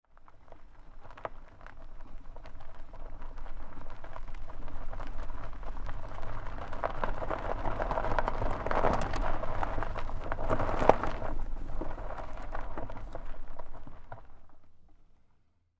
Car Tire Onboard Gravel Dirt Roll Slow Short; Cars

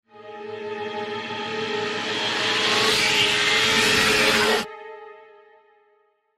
Crescendo Sweep Cold Climax; Trailer; LYN115 Trailer Production Elements - Effect 08

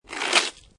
Snow And Ice Ice Or Hard Snow Grate Short; Weather; Lynne Music

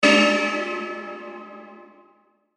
METALLIC FAST, Metallic Fast Attack; Metallic Fast Attack Long Ringing Reverb

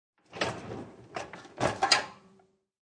Open Dish Washer, A dishwasher being opened.; Kitchen; Household

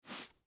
Towel Wipe Off Table Single Fast; Foley; Bathroom; Household; Blastwave

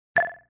Short Ring

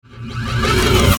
Crescendo Flange Delay; Rise And Dive; Blastwave

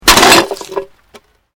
Glass pane impact no break

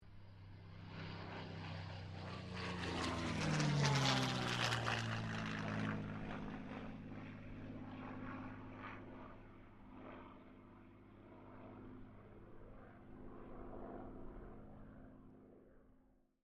AIRCRAFT CA-18 MUSTANG PASS

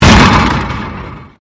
Convolution Pulse; SoundEffects Foundation2

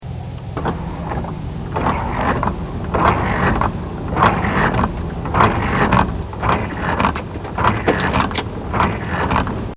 Driving With Wipers On, car; Vehicles

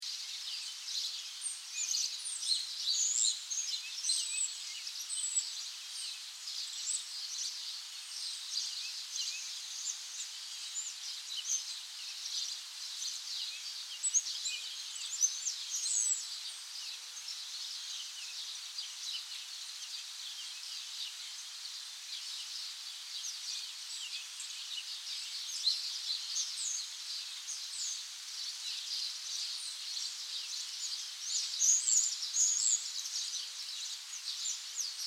Ambience, Forest, Birds, Active, Light, Wind; 5.1

